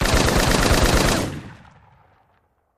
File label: Machine Gun Single Rapid Burst Fire